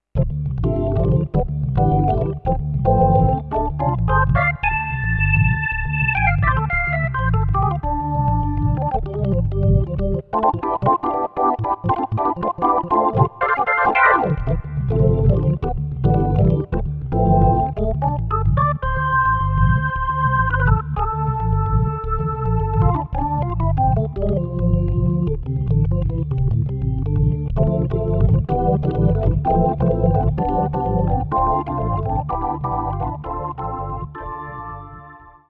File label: Click Tonewheel